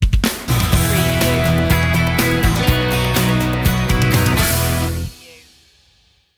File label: bright tomorrow sting; refined